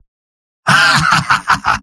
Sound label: laugh happy; Spy; mvm